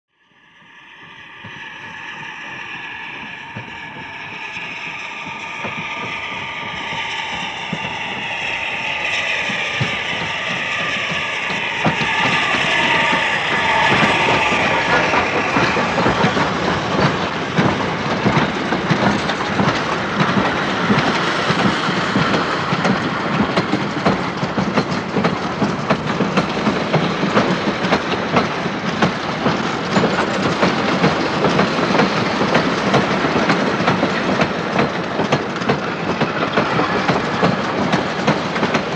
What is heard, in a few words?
By Slow Steam Train Pa